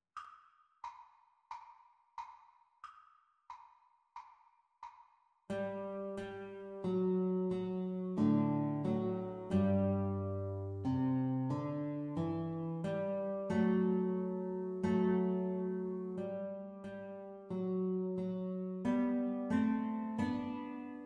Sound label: Guitar Duet Playalong Exam Speed